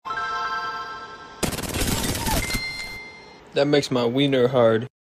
win; apex

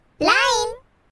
iphone 12 pro max line

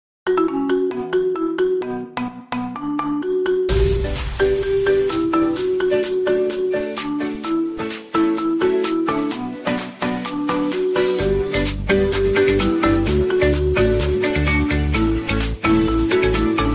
Marimba Remix

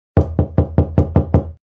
Door Knocking Sound

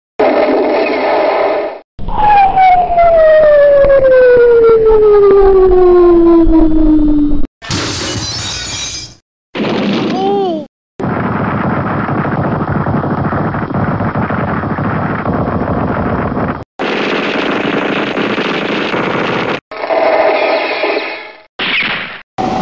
War Sound Effects

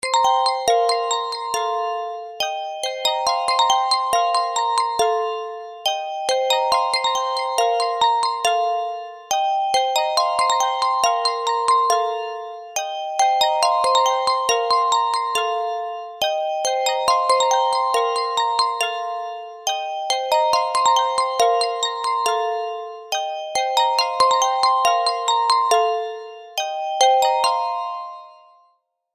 iPhone Music Box

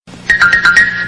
bewitched

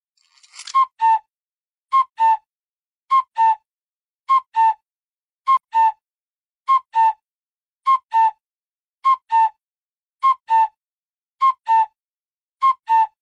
cuck cu

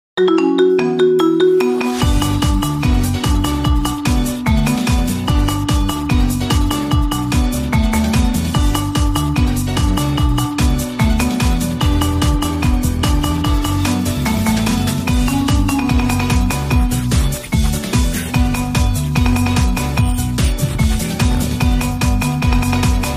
Marimba

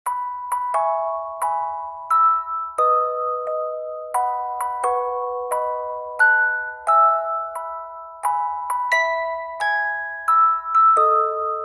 Happy Birthday to You Music Box

